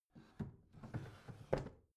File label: bathroom drawer open soft